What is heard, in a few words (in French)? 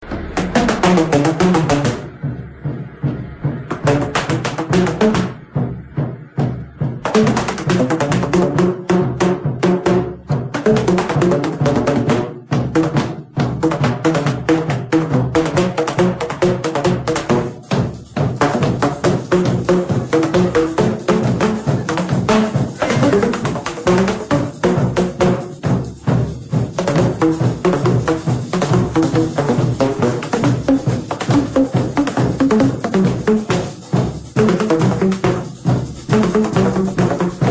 Musique percus